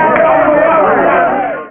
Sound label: Angry Mob; Regicide v8